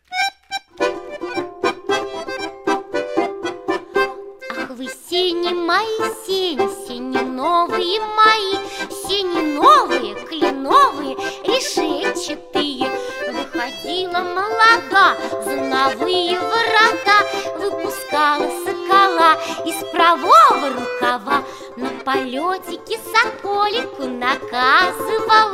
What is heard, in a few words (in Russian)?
русские народные песни для детей; Ах вы сени мои сени